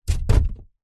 polystyrene thin sheet set down on concrete; foley; zapsplat

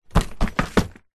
foley luggage suitcase set down carpet; zapsplat

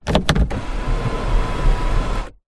transport car suv electric sun roof raise; holden captiva

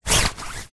household umbrella closed movement hand

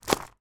foley footsteps stones; zapsplat

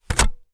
household cd pull out of player hard

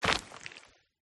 Single Step Footstep; PMSFX

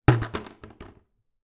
bucket plastic small drop on hard floor; household; zapsplat

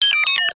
science fiction robot beeps chirp short

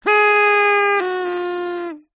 party horn blow toot; zapsplat; foley

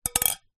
household plastic wine glasses bang together

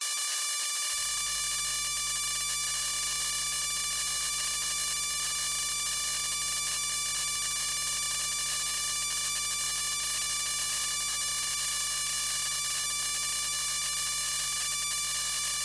Source RAW Electro Magnetic; PMSFX

